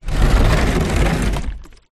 industrial cannister small plastic liquid slide concrete; zapsplat